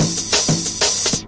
straight up dnb filter break, DRUM LOOPS and BREAKS, 185 bpm